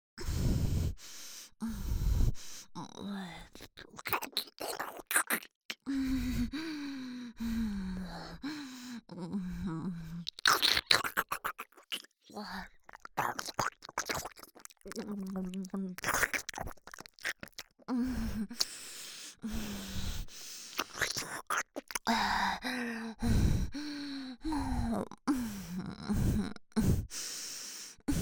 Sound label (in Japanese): 鼻息耳舐め編 左耳